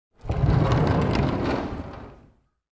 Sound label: door classroom slide open; anemoi